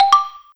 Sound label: upside down; Linux; notifications